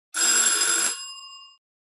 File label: Clockwork doorbell loud ring